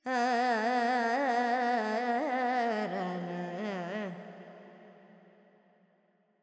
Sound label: FX Dorian, Vocals, Carnatic Female, Alaps, 70 G